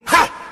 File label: superjump; freak fortress 2; laszeski